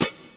HH HEAVY PEDAL CLOSED, Roland TD 7; drum machines

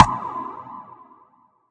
pong verb clap, drums single hits; Claps

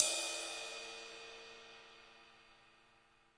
Ride

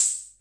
hho, Hammond Auto Vari 64; 1974

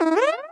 birth, video games

